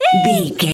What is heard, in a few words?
voice fun small character emote scared